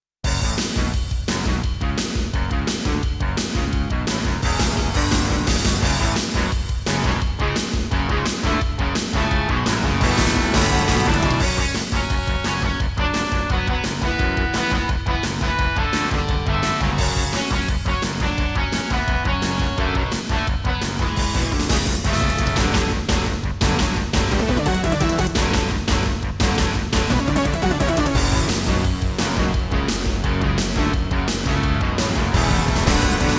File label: Intro, Roland SC-88ST Pro 55MAP; Duke Nukem 3D